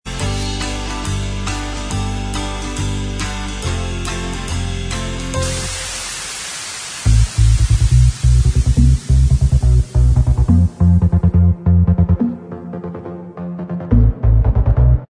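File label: Instrumental Track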